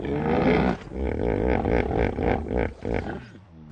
squid die

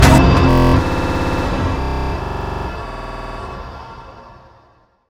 powerup

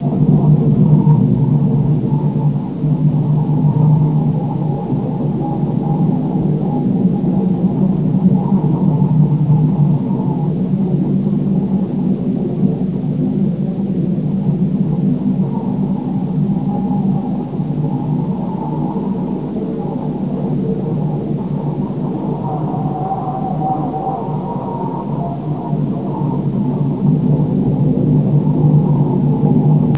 wind inside; temple

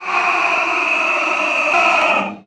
aaah

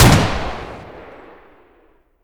glock fire; weapons; kuilu

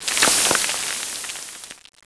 sand; de loststation cz

